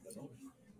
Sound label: hahaha